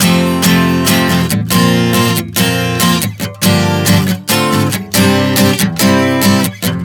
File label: F-Bbadd9-Gm-Dm-C 140 bpm, acoustic guitar chord progressions